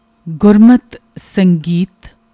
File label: Gurmat Sangeet